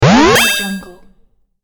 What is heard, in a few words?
attract power game hit